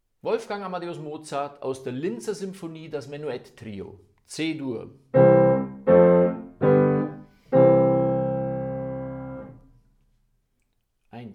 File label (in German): Menuett Trio; Mozart Linzer